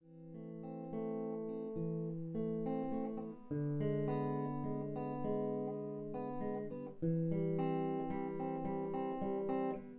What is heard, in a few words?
no drums synth conv 5cm; SASSEC07